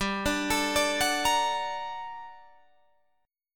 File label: G Minor Major 9th, down, Guitar, Open D